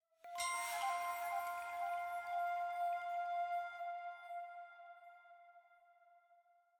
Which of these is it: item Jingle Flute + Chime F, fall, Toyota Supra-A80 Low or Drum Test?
item Jingle Flute + Chime F